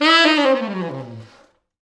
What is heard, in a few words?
T Sax falls, Horns Sax